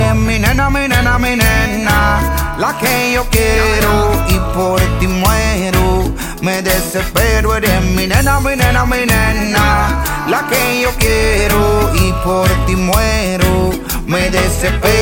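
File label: Spanish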